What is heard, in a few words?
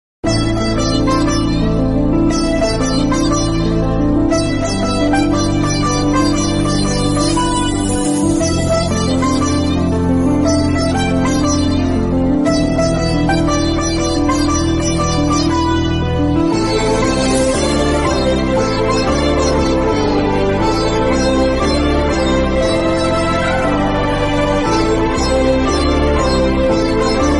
Indian Love Tune Telugu Movie BGM